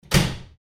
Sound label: door